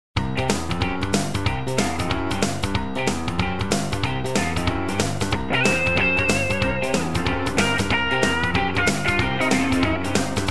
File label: Rock n Roll Groove